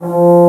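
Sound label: Bassoon F2; Digital Sound Factory Virtuoso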